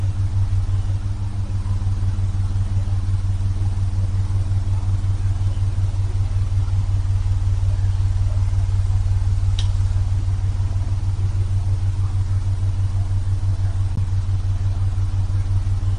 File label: fan; baby sleep